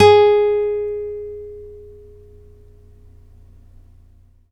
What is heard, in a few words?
NYLON P G#3, GUITARS; Synclavier Sampler Library